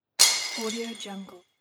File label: breaking glass; fascinatedsound